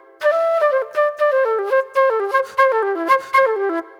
Soul Plate Flute

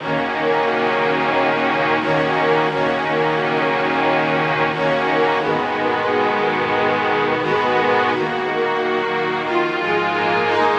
89 D#m Dirty Strings, Melodic Loops; Electronisounds

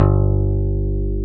G1, BASSES, Roland

